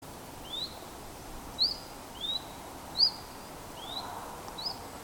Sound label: mixed huit sweeoo pair